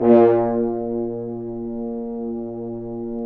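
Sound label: BRS FHRN, French Horns Accent; Roland L-CDX-03 Disk 2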